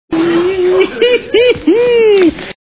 heeheehee; Letterman

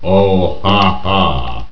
haha; Lost in Space

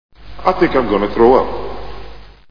throw up; Capricorn One

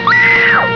scream; Star Trek

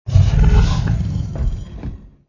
growl; X-Men

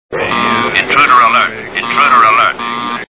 intruder alert; Star Trek

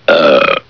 burp; homer; Simpsons

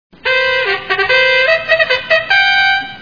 fanfare; Bullwinkle

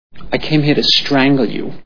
strangle; Manhattan

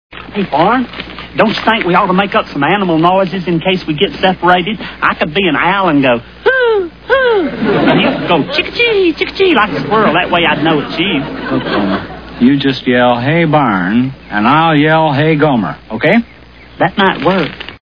animal noises; Andy Griffith

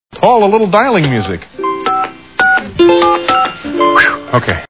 dialing music; Letterman

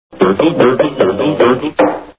nasty gurgle; Looney Toons

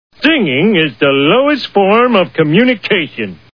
singing; homer; Simpsons

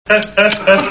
laugh; Beverly Hills Cop; murphy

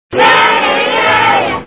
yaah yaah; Star Trek

